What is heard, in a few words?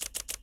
burst shoot; Alcatel Idol X